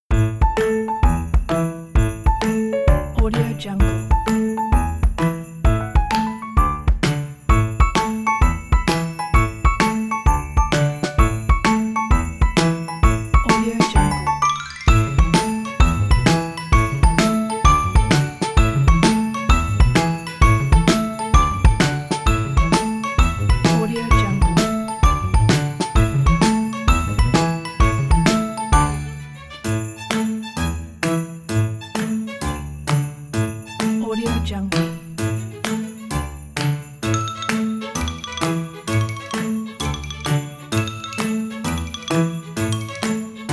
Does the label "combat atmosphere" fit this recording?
No